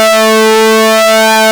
SYNC, ARP Synths; Analog Odyssey; E-MU Formula 4000 Series Vol. 3 – Analog Odyssey

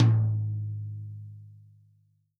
TOM, Real Drum Kit, POP KIT

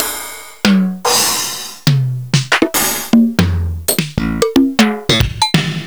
Korg ddd5

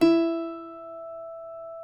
CUATRO E 4, Guitars; Spectrasonics; Hans Zimmer Guitars VOL-2

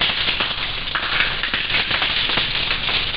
water rain; ST-XX Amiga Soundtracker Sample Packs

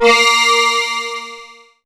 BELL-GONG, A#; Big Fish Audio

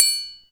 Perc, Alesis DM5; Drums

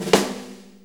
6-8 Snare Flam, Drum Loops; Sonic Foundry (Sony Creative Software); Loops & Samples Drum Tools; Flams

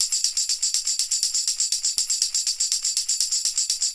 Tambourine Loop; Sonic Foundry (Sony Creative Software); Electronic Point Blank

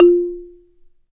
BALA, Balaphone

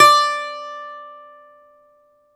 BALALAIKA, Prima Balalaika, Plucked Strings; E-MU Producer Series Vol. 7 – Old World Instruments

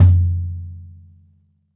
bop kick - snares on; JazzFunkKit

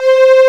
Residential District, SNES; Metal Slader Glory - Director's Cut

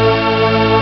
D50 nightmare; ST-XX Amiga Soundtracker Sample Packs

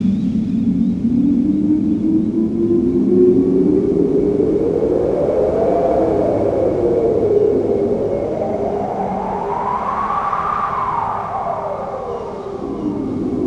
ambience

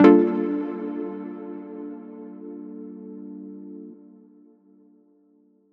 G min Liquidz, Chords; Electronisounds

